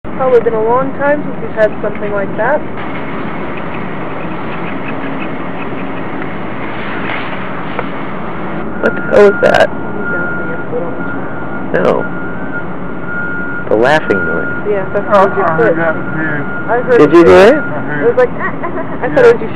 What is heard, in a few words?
laugh